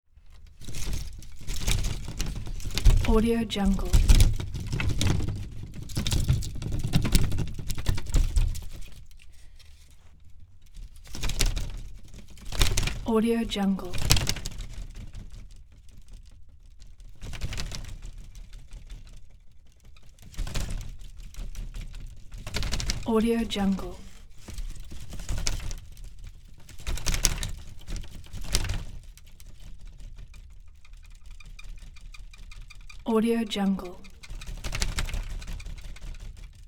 wood lattice heavy rattle; prosoundfx

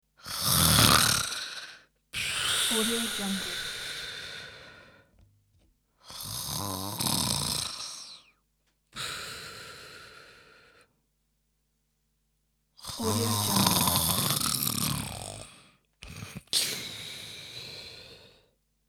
walking on leaves

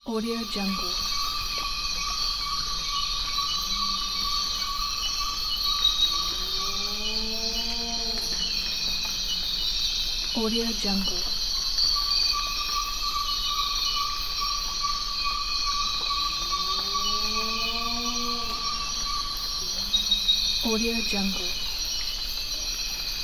jungle sounds

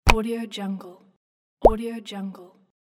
click button; 3dhome

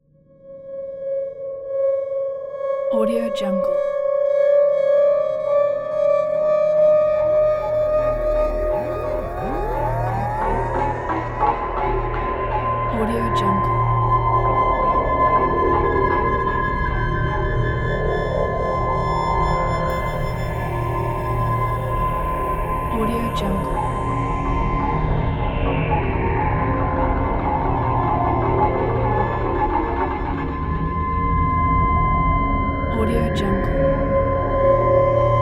atmo drone horror siren; smartsoundfx

